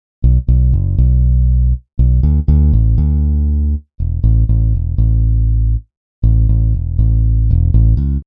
bass medium; fielding dsp reviver v1 3 8

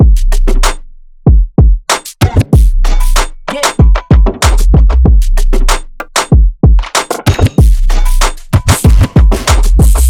Percloop; jsewell beats imperium ii production suite

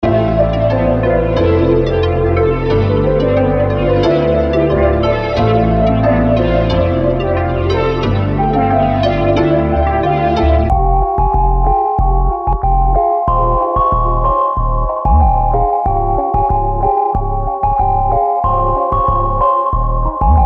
Modern Latin Kit Medley; Kontakt; native instruments leap modern latin; maschine expansions

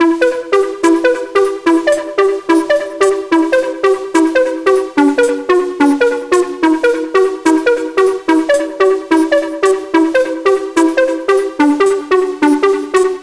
LEAD LOOP 145, techno; modern; teknovault modern techno production suite

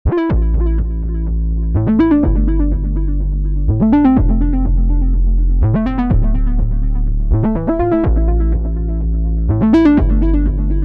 Amin, u-he diva presets; basic wavez visions vol 4; Aalenian

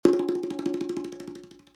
Conga Roll Processed F, deep organic percussion; basic wavez deep organic percussion

